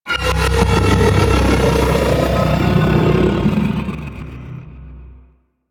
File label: Arachnoid Camper Vocal Ability; Warframe; Corpus